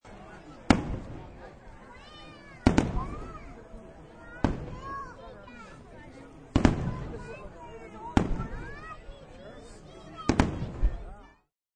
Fr Wrks Crwd, Fireworks with crowd sounds.; Multiple Fireworks with Crowd

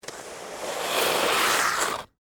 Broom Sweep Ice, Snow; Weather; BlastWave

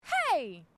FEMALE EXCLAIM; Female vocal sounds; Exclamations; Sound Effects:Voice; Foundation3

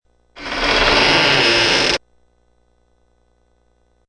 Slow low pitched creak as a door slowly opens.; Door Creaks Low Pitch; Miscellaneous Door Sound Effects

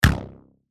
Rubber Band Snap; Cartoon Flick; foley; Miscellaneous Office Sound Effects; Blastwave

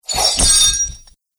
Chained Weapon Thrown; Battle Sound Effects

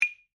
Claves Hit; Musical; BlastWave

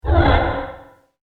Dark Echo Whoosh; Whoosh Sound Effects; Andrew Fleming

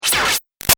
Shot Laser Fuzzy; Sound Design; Lasers; Big Room Sound

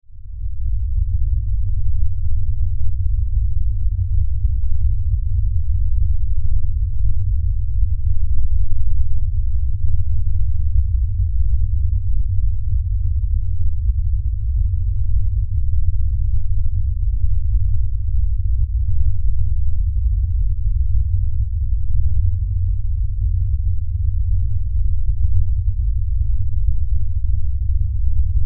LFE Rumble, Lfe Rumble Low Bass

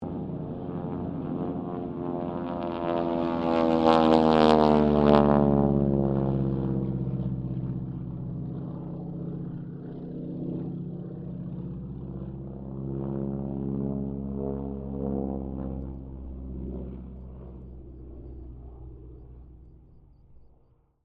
Harvard squadron 4 pass, stereo

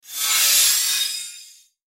Sci Fi Transform Evaporation Disintegration; Blastwave

